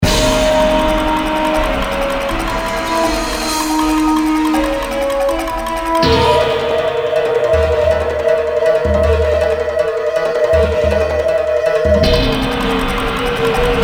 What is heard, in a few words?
fast, music; Soldier Of Fortune II Double Helix; XBOX; hongkong